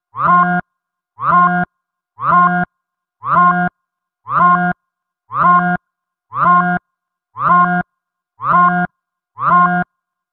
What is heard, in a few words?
red alert ringtone